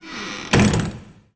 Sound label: door close; p00gie